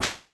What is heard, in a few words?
hit; Incantation